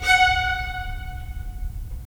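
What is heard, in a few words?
strings violins, right; Roland - LA Composer vol. 4; 90 s Sample CDs; Violins Sh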